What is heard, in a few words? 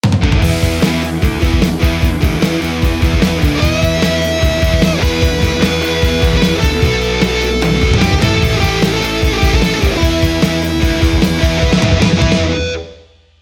white modern, ampfree; aradaz